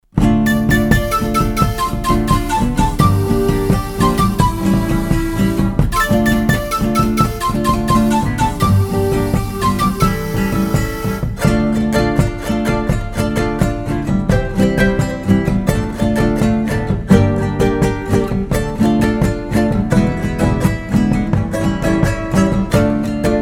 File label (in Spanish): Pista instrumental sin melodia